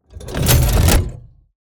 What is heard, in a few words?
Machine lever pull